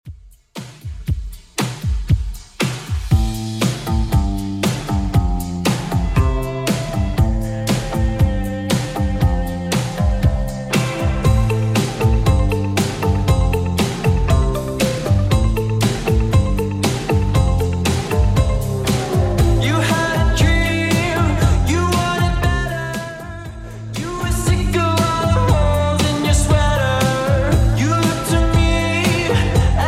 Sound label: Top40 Redrum 118bpm